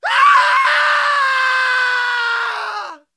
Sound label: panic scream; zombiepanic